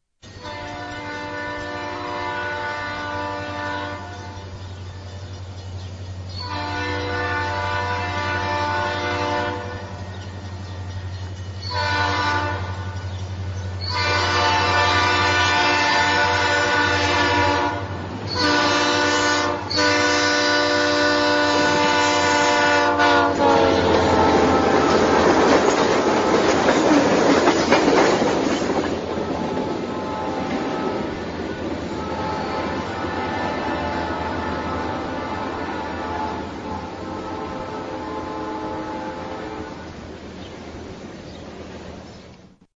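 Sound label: Doppler Train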